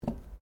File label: cutlery general place cloth, Household cutlery; Kitchen